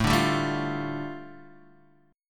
A 7th Suspended 4th Sharp 5th, A, down, Guitar, DADGAD